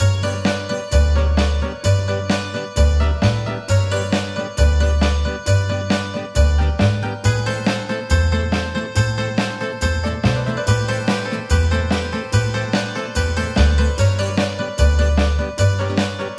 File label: sirtaki; Ethnic